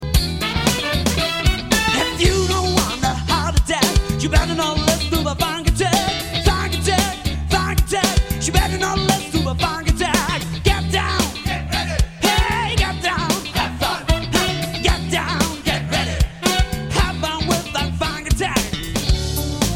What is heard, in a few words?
funk attack